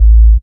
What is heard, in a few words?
DR. LOOP, BASS; X-Static Goldmine 3